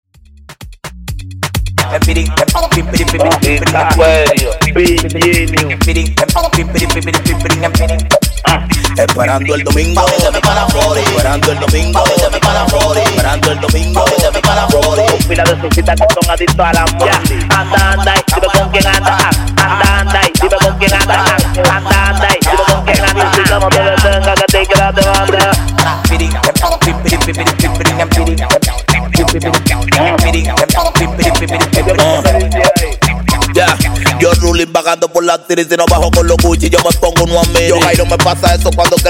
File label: Funk Transition 128 106 Bpm